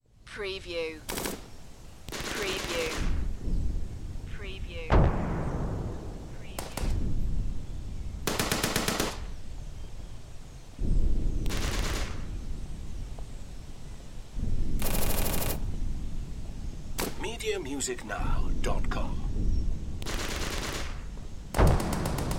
Warfare WWII World War Two Battlefield Forest Distant Explosions